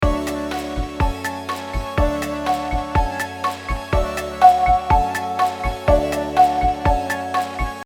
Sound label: Idea created using MIDI plugins; 10 track starters